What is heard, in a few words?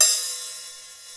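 Lite open hi-hat, Roland JD-990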